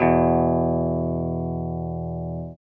guitar 5TH

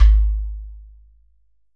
GHATAM; Q Up Arts; Denny Jaeger Private Collection

